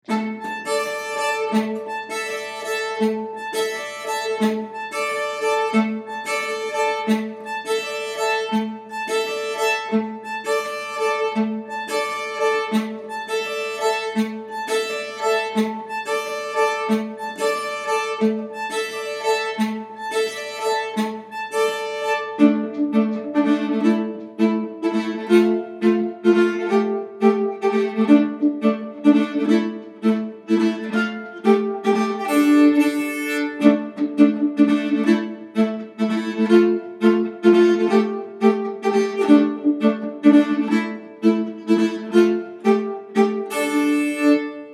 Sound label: Schottis, nyckelharpa; Fran Idre; Harmo